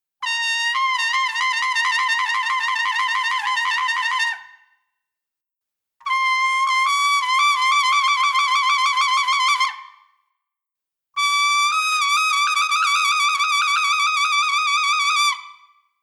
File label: Shake C-F